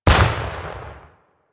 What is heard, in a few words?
Vial Blast Shatter; Warframe; DE; Warframes; Alchemist; Elements; Ability